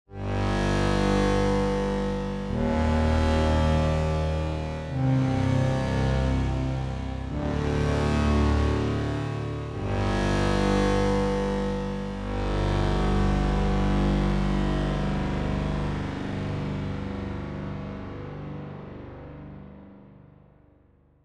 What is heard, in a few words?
Epic Low Swells